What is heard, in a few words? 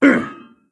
Wound; Creature; Stormcaller Brundir